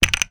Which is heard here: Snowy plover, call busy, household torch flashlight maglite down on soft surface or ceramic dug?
household torch flashlight maglite down on soft surface